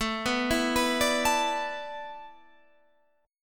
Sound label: A Suspended 2nd Suspended 4th, Guitar, Standard; Asus2sus4 arpeggiated; JGuitar